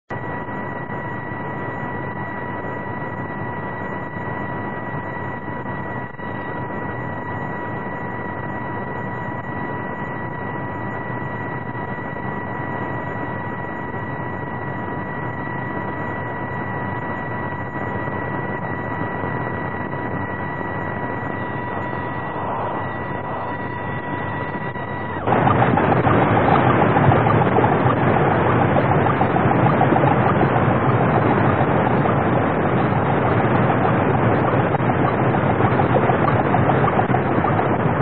noisy

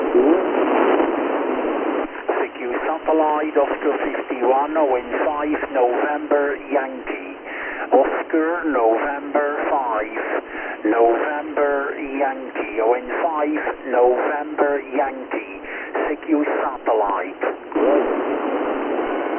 13cm downlink; ao51

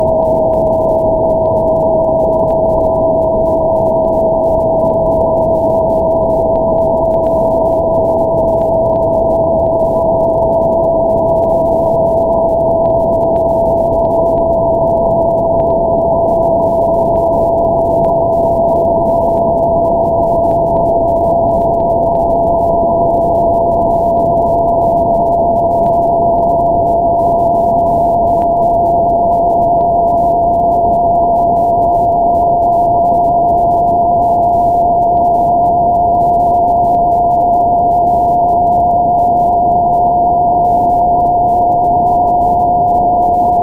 moon lander 2280.0 MHz approaching perigee; SpaceIL Beresheet; DF2MZ

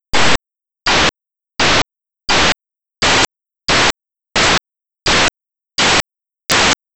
AprizeSat-7

